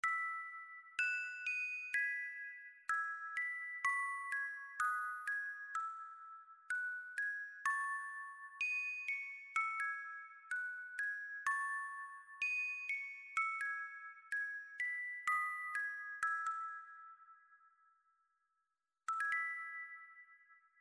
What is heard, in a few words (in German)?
Klaviertrio Celesta, spieluhren